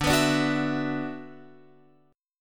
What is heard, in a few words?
D 6th Add 9th D, Guitar, Open F; D6add9 strummed; JGuitar